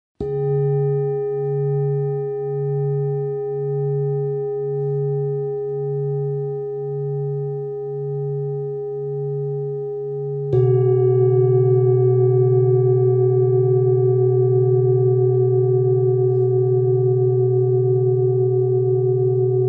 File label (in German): Klangschalen Träume